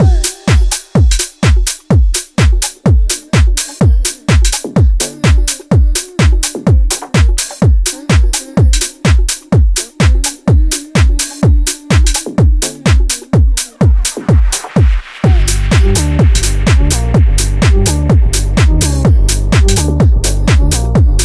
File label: Bossa Nova Extended; Remixfreakz